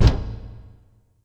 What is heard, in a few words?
Percussive Stab